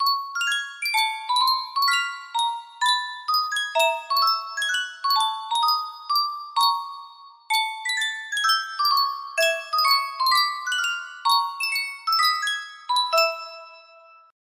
Sankyo music box

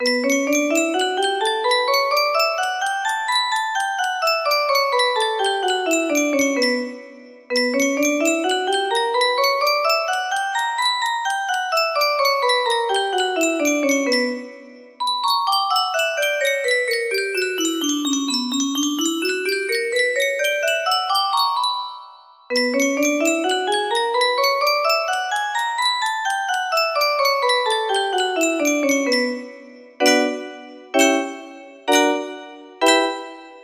b minor, melody

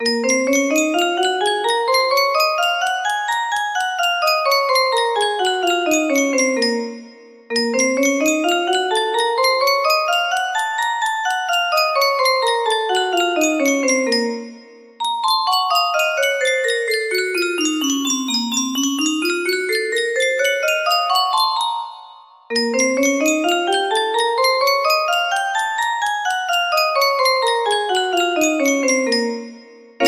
b flat minor, melody

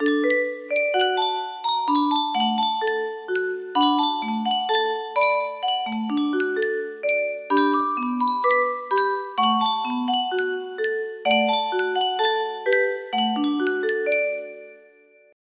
Yunsheng music box

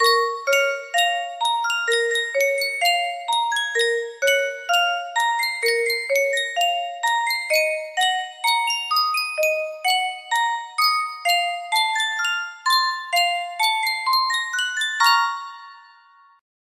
yunsheng custom tune music box

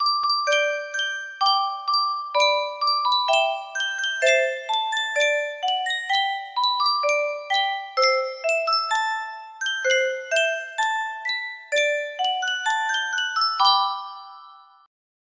yunsheng spieluhr, music box melody

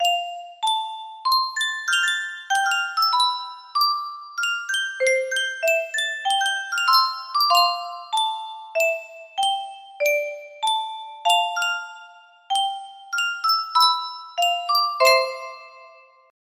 yunsheng custom tune music box

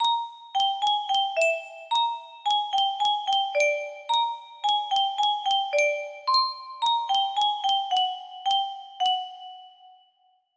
lobby theme music box layer, melody; upshot lifeline

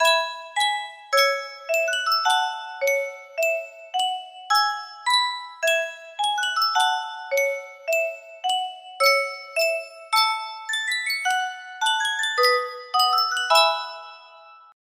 music box, music box melody; sankyo

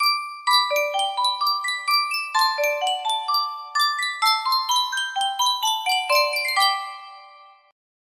Sankyo Miniature Music Box, music box melody

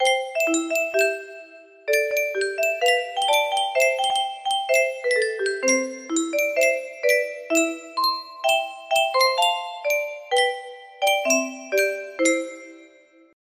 Yunsheng Music Box, Unknown Tune

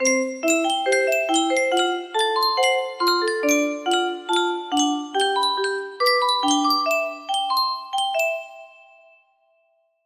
melody, music box

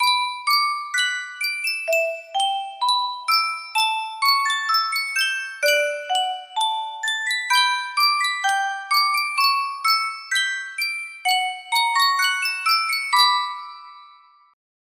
yunsheng custom tune music box

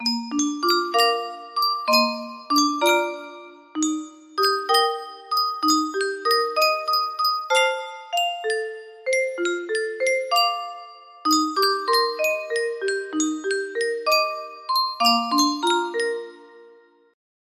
yunsheng music box

